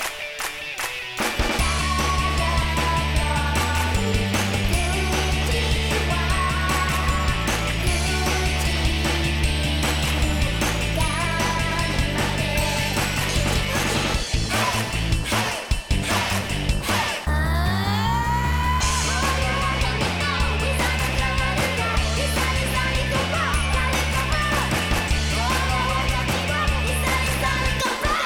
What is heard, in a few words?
Cold Music, Ringtone; NipponBlobbyTV